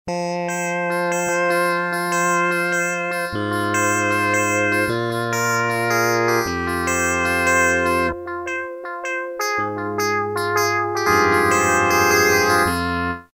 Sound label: Dance Guitar; Roland M-DC1